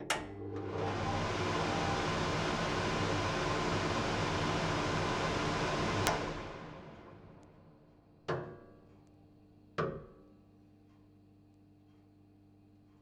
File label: Extractor Fan Kitchen; Extractor Fans